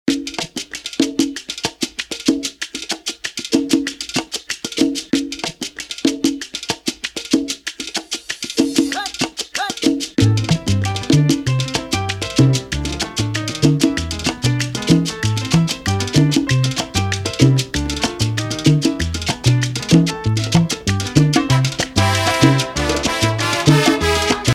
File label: remix intro 96 BPM